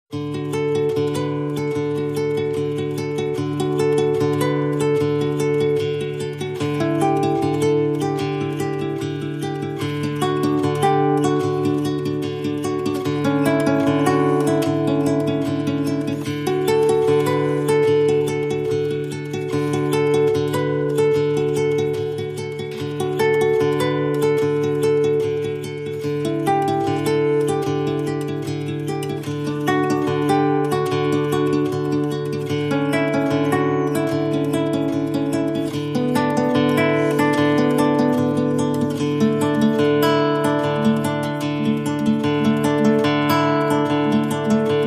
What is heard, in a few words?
Guitar Only